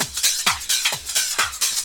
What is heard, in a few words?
drum 130, techno trance drumloops